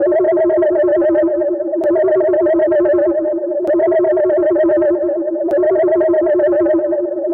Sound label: Tape delay FB Loop; radiosonics